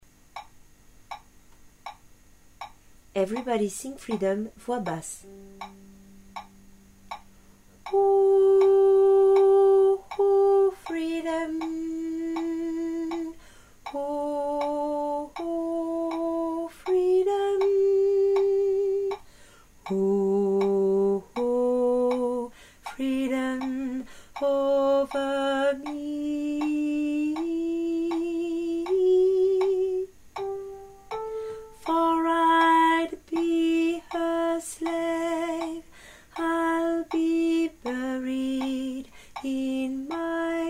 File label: everybody sing freedom basse